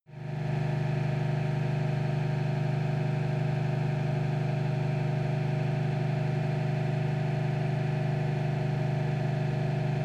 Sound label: car camaro high rpm exhaust